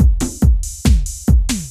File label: techno 140